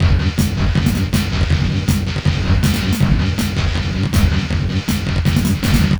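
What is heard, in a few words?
Master Loop, beats; Sonic Foundry (Sony Creative Software); Esoterik Beatz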